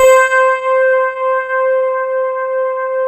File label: DW8 EP C4, Korg; Back In Time Records; Korg Universe VOL-1